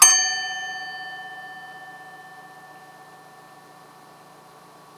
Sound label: atc bell